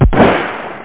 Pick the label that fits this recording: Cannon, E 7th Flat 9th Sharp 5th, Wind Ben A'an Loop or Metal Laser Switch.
Cannon